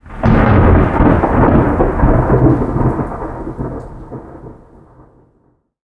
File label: thunder; Earth and Beyond; EA Games